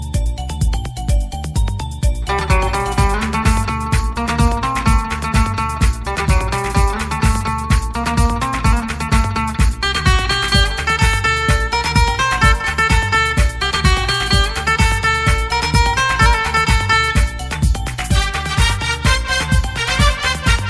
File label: INSTRUMENTAL RINGTONE